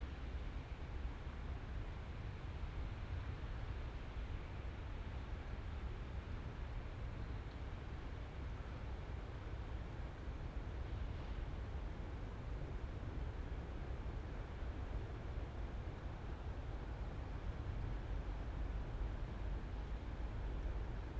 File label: yamanakako sound